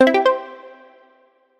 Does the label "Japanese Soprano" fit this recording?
No